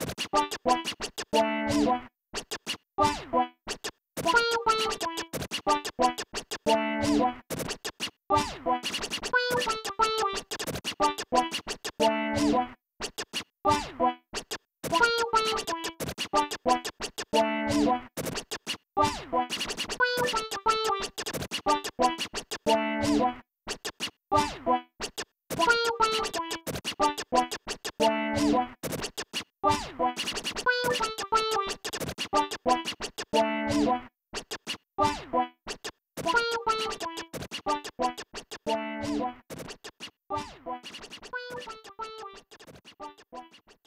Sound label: Rap Battle (Game Over Track Only)